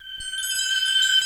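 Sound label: voxy wind chime; Greytsounds; F2-SYNCLAV 2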